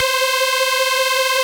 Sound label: C Synth, One Shots